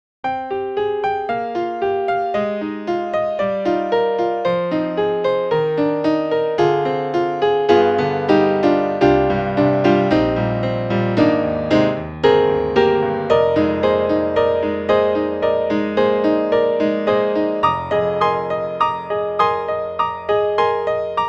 Piano 2; Native Instruments; BANDSTAND

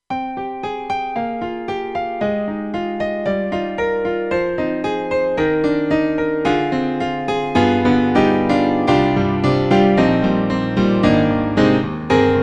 Korg X3R Piano

